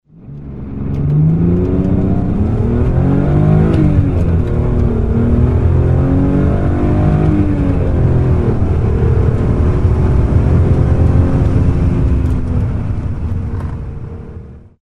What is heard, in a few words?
Z3 Coupe 2,8, bmw